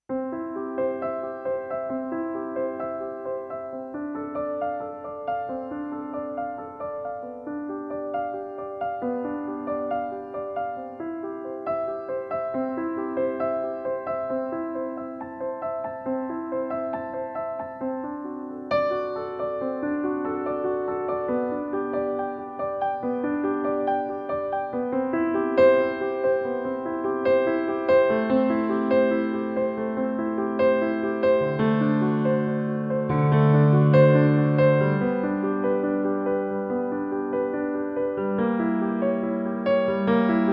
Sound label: Grand Piano; Bach